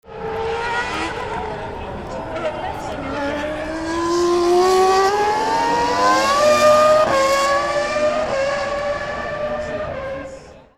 F1

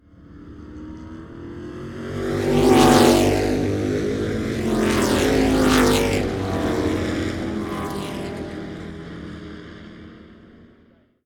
Can Am pack, engine

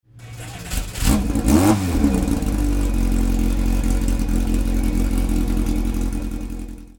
Chevron B19, engine

